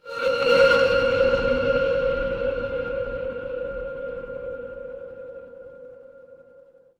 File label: horror stinger